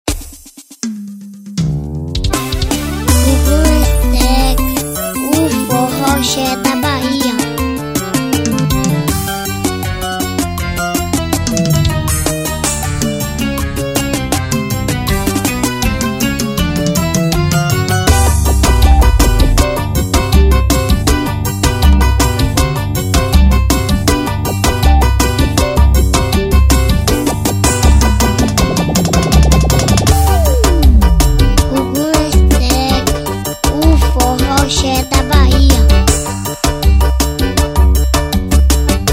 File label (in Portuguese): Forroxe, Instrumental